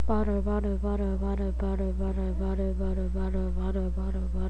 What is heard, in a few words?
bottle